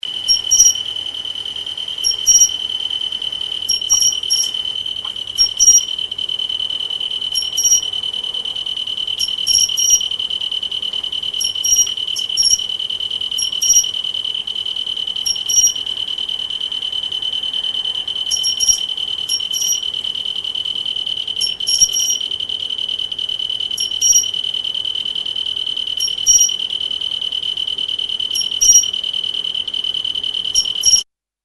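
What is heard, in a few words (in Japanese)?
ヒロバネカンタン 鳴き声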